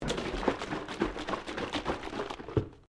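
Carton orange juice cardboard shake, Milk or juice container; Food Sound Effects; Food (Sound Effects:Household)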